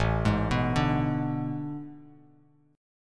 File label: A Major 7th Suspended 2nd Suspended 4th, Bass Standard; AM7sus2sus4 arpeggiated; JGuitar